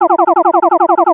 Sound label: Arcade Movement, Video Game Sounds; 1001 Sound Effects